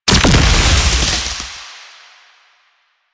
grenade explosion; Enemies; Soldiers; Weapons; Warframe; Lasrian; Goonadier

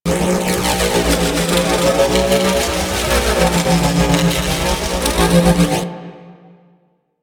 Short Bow Charged Ice Projectile Loop; Warframe; Duviri; Dax; weapons

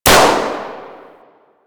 Balloon Pop

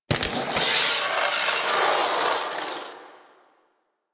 Equip; Warframe; The New War; Quests; Weapons; Omicrus

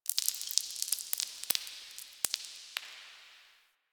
Stone Debris; Warframe; Quests; TheNewWar; Ambience; Zariman

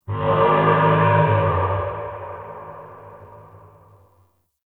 Swamp Low Moan; Ambience; Midrath Island

